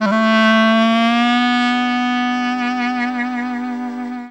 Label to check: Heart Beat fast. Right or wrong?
Wrong